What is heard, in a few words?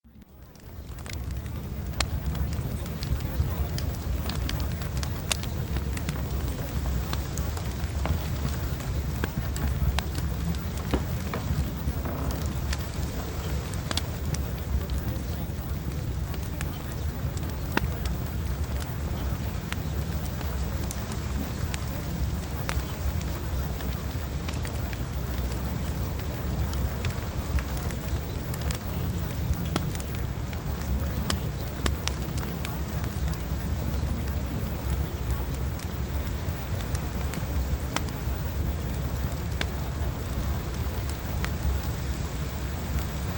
Fireplace